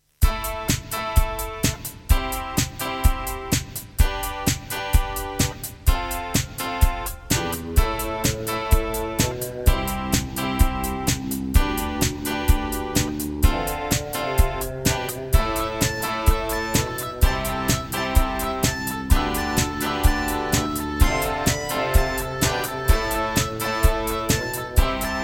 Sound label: EMU Sound Engine; raptor call of the shadows